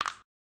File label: snap, interface sounds; p0ss